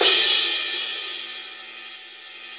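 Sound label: CYM CRA, crash cymbal; Sound & Vision - Gigapack I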